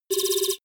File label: typewriter sequence